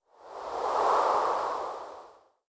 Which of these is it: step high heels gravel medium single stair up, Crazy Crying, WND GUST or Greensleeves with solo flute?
WND GUST